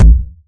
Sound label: low tone kick sample a sharp key; Duration 0:01; kick drum samples